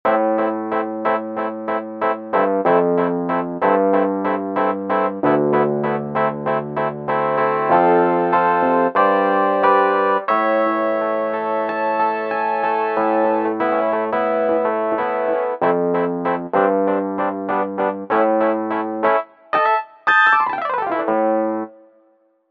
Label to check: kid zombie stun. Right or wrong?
Wrong